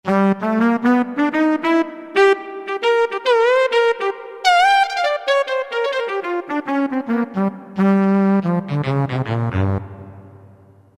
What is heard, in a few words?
Sax 2; Roland MT-32